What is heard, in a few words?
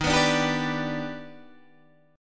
D sharp Augmented Major 7th D sharp Guitar DADGAD